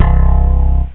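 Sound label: bass crash; jazz jackrabbit 1; M8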